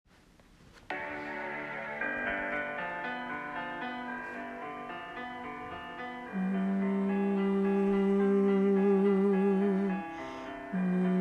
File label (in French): prelude vocalises fin; Prélude vocalises fin – Groupe de gauche